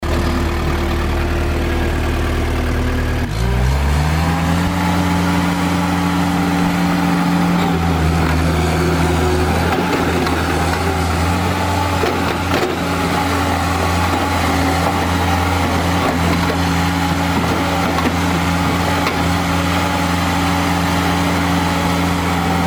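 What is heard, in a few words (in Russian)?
бобкэт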